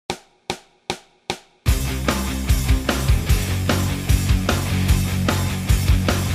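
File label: backing track fast; lick of the week no 31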